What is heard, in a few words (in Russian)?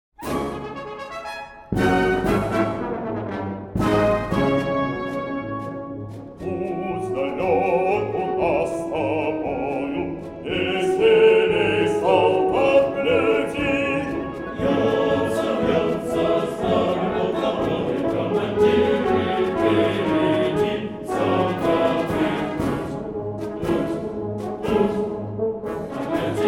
ПРАВОСЛАВНЫЙ СОЛДАТСКИЙ ХОР; ИНЖЕНЕРНЫХ ВОЙСК